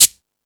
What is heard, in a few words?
Timbale, E-MU Pro-Cussion; drum machine